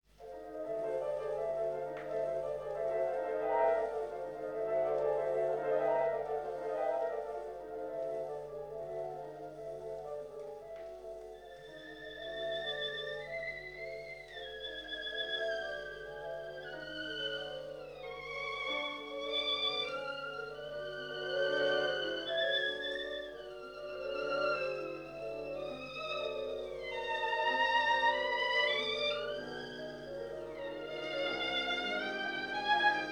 Szymanowski La Fontaine d'Aréthuse; Cillario

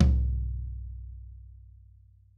tom

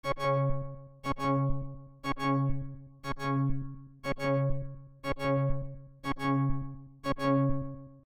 High Danger Health Alert

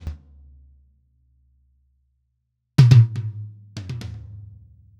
Toms

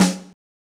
OUTPDSNARE

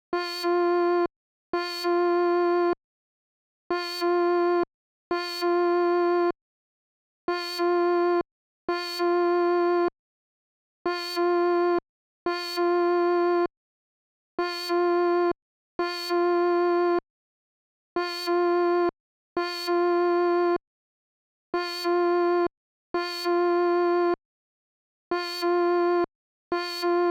alert

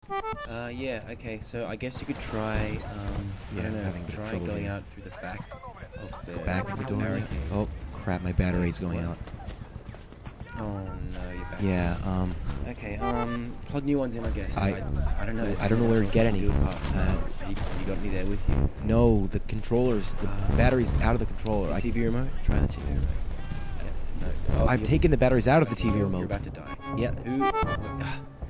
Implemented SoundScape Simulation

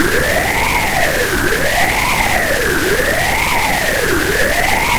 pink noise autowah

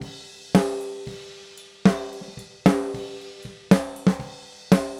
snare

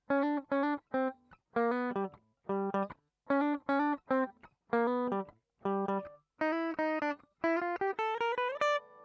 G Mixolydian guitar dry

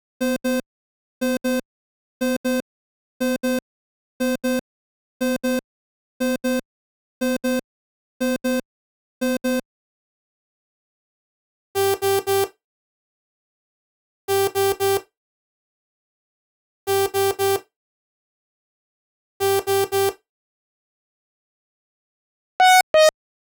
Car Alerts

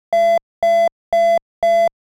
alert

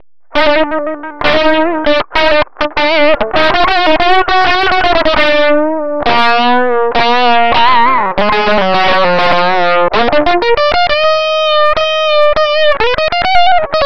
saturated

